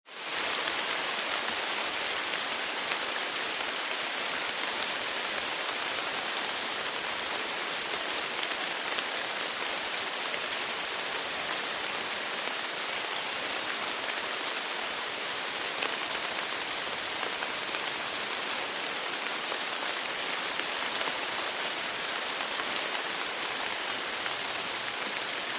rain heavy in jungle drips on trees leaves krabi thailand; lukas tvrdon